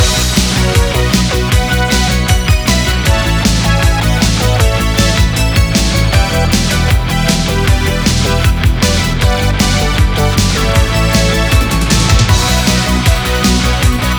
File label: Oth Main Loop; Galaxy of Pen & Paper